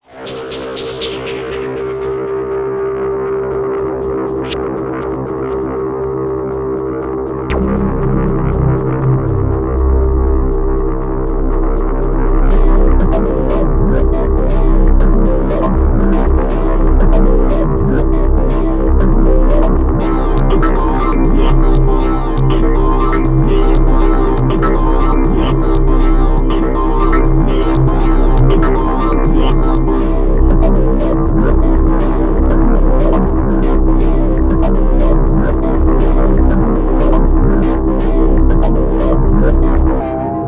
Electronic Atmos 120bpm 5 4

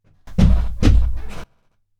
Weapon Hit Swoosh

